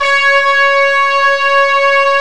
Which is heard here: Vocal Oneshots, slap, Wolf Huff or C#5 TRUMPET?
C#5 TRUMPET